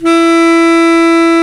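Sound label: Bass Clarinet; Roland L-CDX-03 Disk 1; Lo Clarinets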